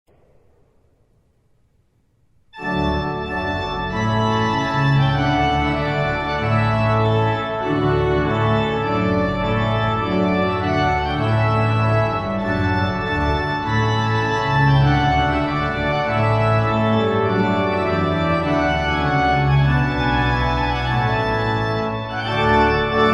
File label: Trumpet Tune; Purcell